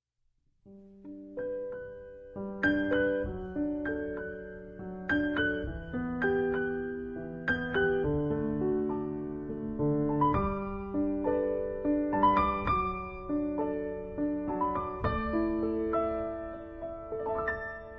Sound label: Piano; Sorella